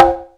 drum b1, DRUMCOMPUTER YAMAHA R5+RX7; Best Service ProSamples vol.55; Retro Sampler

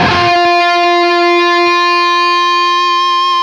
LEAD F 3, Guitar; East West; Steve Stevens Guitar Samples Collection

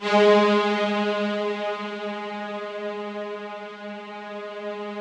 G#2, ROUGH STRNGS, Yamaha VP-1; 90 s Sample CDs; Music Store

